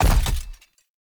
Step; Warframe; Grendel; Grendel Deluxe

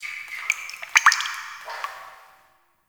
Drip, ambience; Warframe; TheNewWar; Zariman

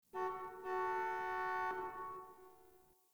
Parking Lot Distant Car Horn

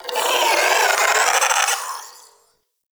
Shadow Vocal Idle; Warframe; Duviri

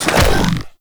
Hoverboard Jump; Vehicles; Warframe; Venus Hoverboard

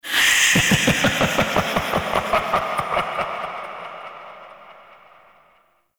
Dissolve; Warframe; Mods; Peculiar Mod

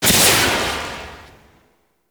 Swarm Missile Launcher Fire 2D Tail; Warframe

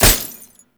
Tank Weakpoint Impact; Enemies; Gameplay; Lasrian Tank; Warframe

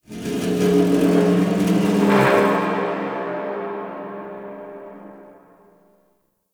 Stadium Metal Creaks Close; Ambience; Warframe; Nineteen Ninety Nine

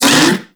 Kubrow Armored Vocal Pain; Warframe

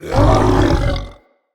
Corrupted Melee Attack Growl; Vocals; Bromius; Animals

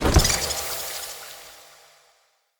Merge Magic SFX; Cinematics; Bromius; Bromius Quest Verminia Floppet Merge

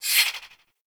Swing, Weapons Melee; Warframe; Modular Melee

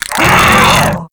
Desert Skate Vocal Pain; Warframe

